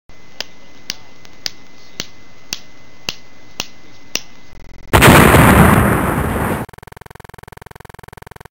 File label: the mysterious ticking noise; Stop-motion